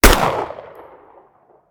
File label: fire, weapons